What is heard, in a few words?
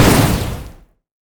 missile barrage mega; LoL; corki